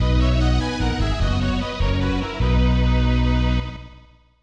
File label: Achievement; Wonder Project J2 Koruro no Mori no Josette; N64